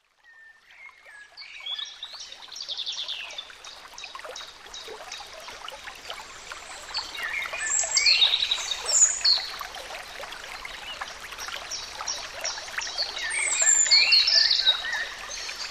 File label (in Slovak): Zvuky prirody